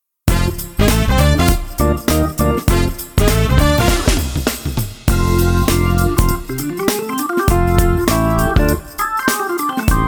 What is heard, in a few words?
Org Gospel